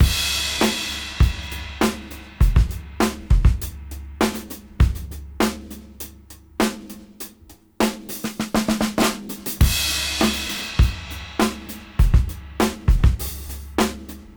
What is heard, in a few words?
Old School 101 bpm